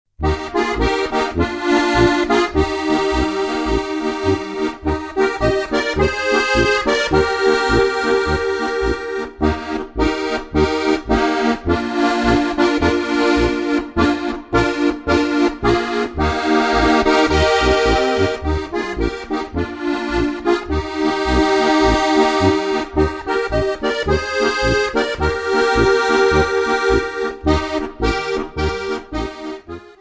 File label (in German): polka